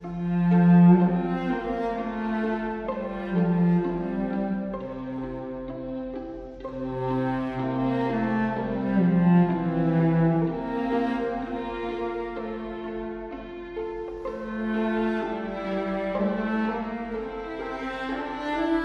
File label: String Quartet; Soleil String Quartet